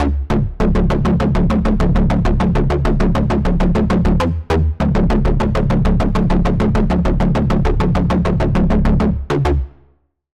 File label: Pluck, Sylenth1; Routed Sound; Sylenth1 Expansion - Doom Carpenter Vol.1